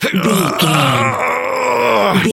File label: voice male effort long